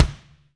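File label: BD, bass drum; Best Service Gigapack 2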